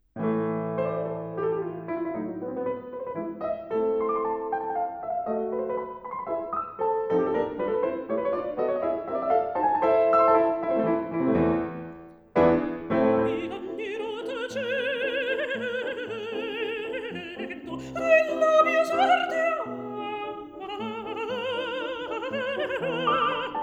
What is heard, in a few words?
settings and album leaves for voice & piano- A major; An Italian Songbook; Mi lagnerò tacendo